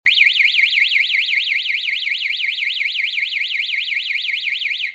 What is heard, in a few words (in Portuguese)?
SIRENE AUDIOVISUAL